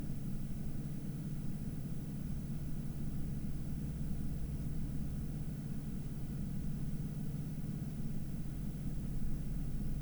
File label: 1000 RPM